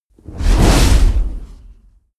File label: whoosh fire noise blast large